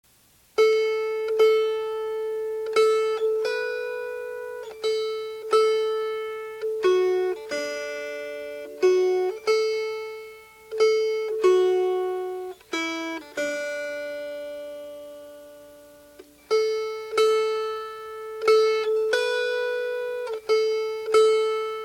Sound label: Melody